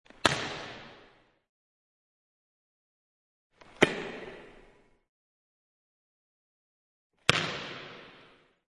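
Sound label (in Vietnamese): tiếng đánh bóng chuyền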